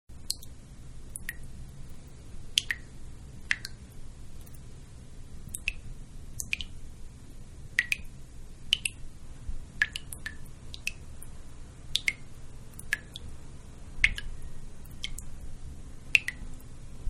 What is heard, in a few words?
Faucet-Dripping; Dripping (Sound Effects:Objects); Manhattan Production Music